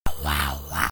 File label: wah wah